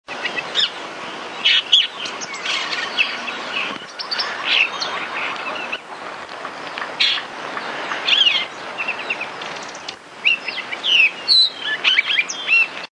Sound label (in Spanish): voz boyerito